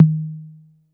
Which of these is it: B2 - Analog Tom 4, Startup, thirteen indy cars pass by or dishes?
B2 - Analog Tom 4